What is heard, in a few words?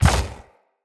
Wave Orb Ground Impact, Powers